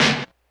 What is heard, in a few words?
snare, Hardcore Breakbeat; Big Fish Audio; Hardcore Breakbeat Action